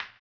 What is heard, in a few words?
HAMBONE, Vocal Blues Soul; Spectrasonics; Planet